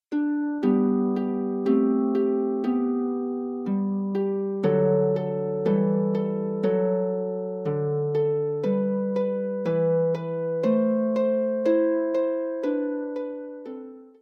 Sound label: Harp; Tudfuls Harp; Mi Welais Jac y Do; I saw a Jackdaw